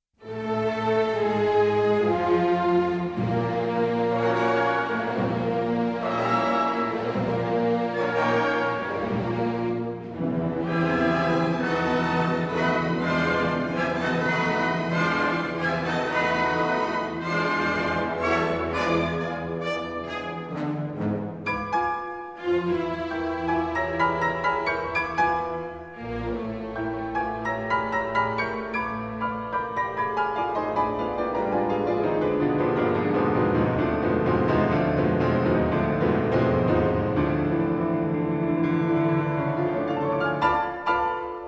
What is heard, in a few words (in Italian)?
adagio molto doppio movimento poco allegretto vivo adagio